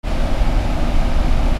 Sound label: HOUSE